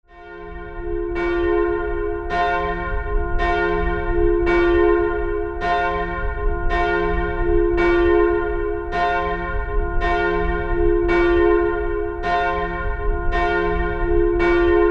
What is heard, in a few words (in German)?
glocke